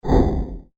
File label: Whoosh Malfunction; Whoosh Sound Effects